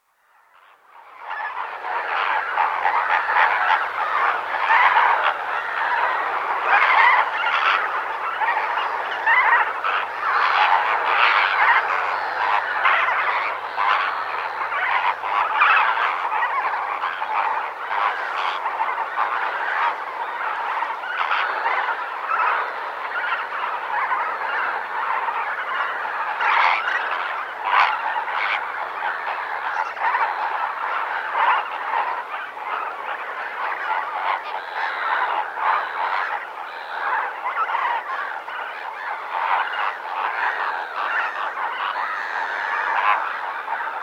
Kenya: Lake Nakuru morning atmosphere with flamingoes; Outdoor Sound Effects; Africa; BBC